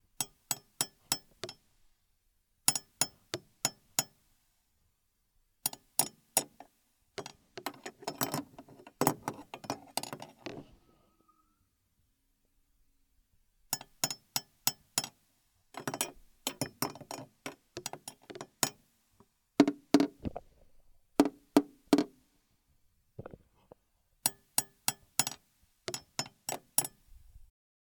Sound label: Metal Clanks Metal Tapped On Metal